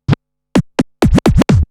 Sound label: Scratch Fills 140BPM